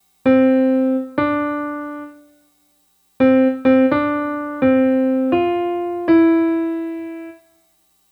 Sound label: major 2nd